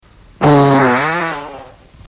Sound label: long fart in meeting